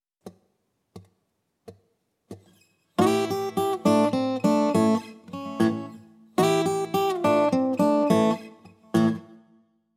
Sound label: Open Tunings For Blues Rock Guitar; An Easy Guide To Open Tunings For Blues Rock Guitar